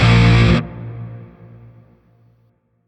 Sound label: Shawzin Phrygian Chord I; Warframe; Grineer Shawzin